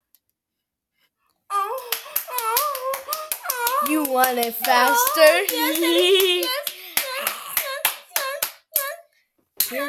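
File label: clapping; rizzerler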